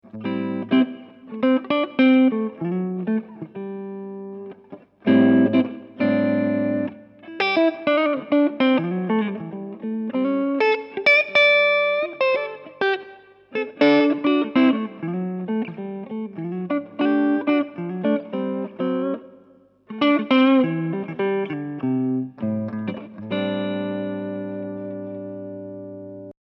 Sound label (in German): clean hals